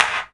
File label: CLAPS HOU; Best Service Dance Mega Drums